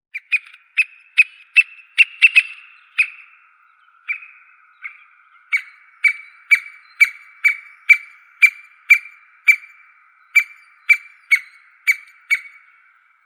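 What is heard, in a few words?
white-backed woodpecker, Dendrocopos leucotos; dyatel belospinnii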